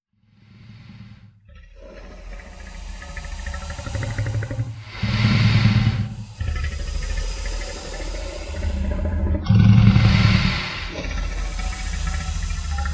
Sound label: Beast Breath